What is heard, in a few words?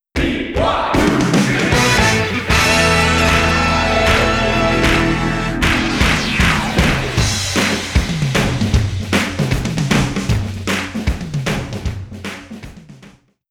Sports Open NO FIGHT SONG; KSL; BYU